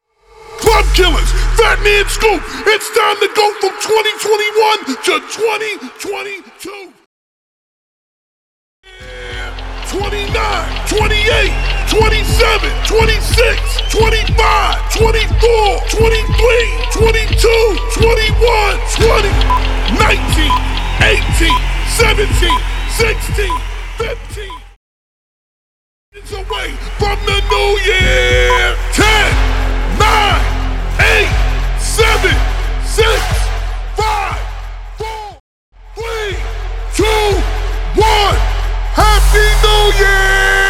NEW YEAR COUNTDOWN; CLUB KILLERS; 113 BPM

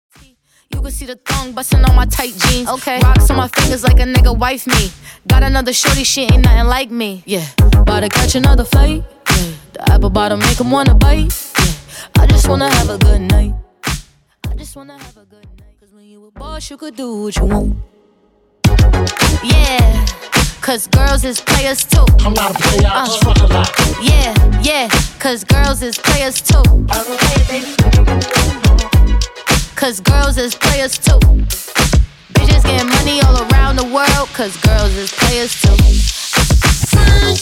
wordplay transition

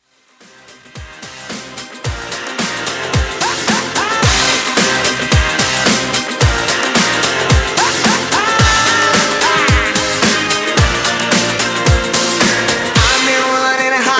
Re Drum 110Bpm

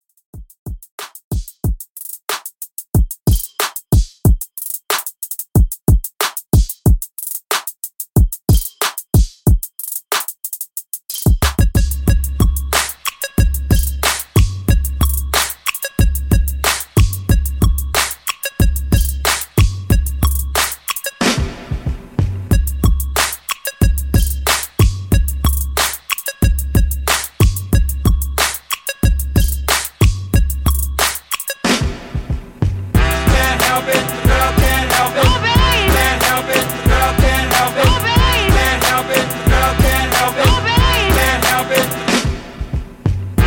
Reload 92 Bpm; Redrum

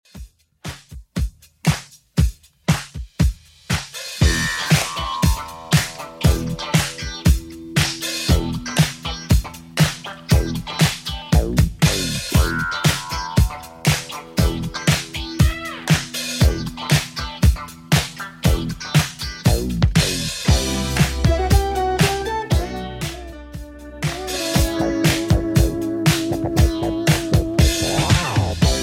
80s Redrum 118bpm

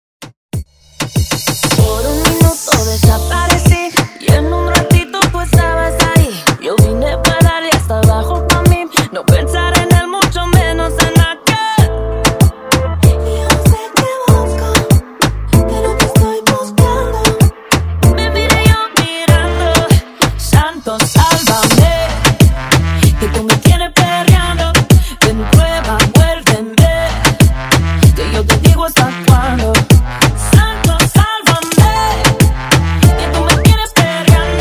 Reggaeton Redrum

